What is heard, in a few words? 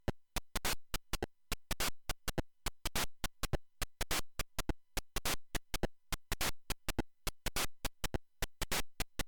Noise